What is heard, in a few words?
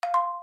puzzle complete